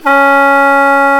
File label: THAI PIPES; Exotic Winds